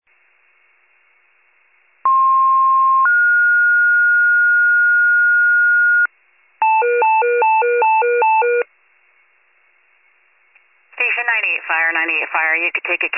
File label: FIRE pager alert